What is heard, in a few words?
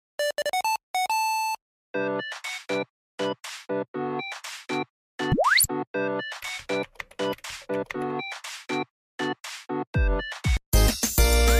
music